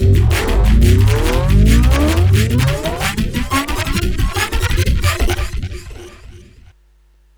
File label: fx drums; EZRollers